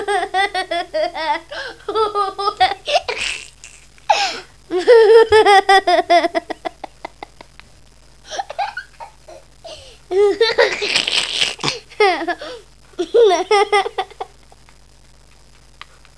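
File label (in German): Gelächter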